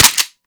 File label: Fire 2D Mech, Weapons; Warframe; Boar